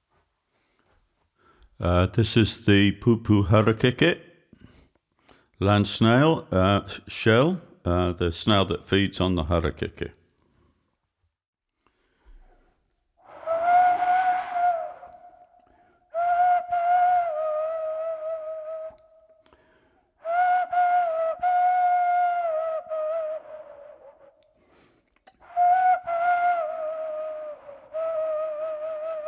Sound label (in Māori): Pupu Harakeke